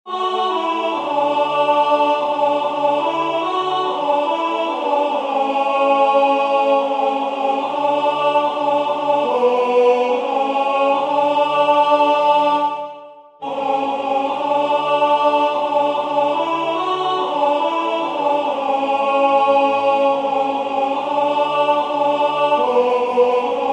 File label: alto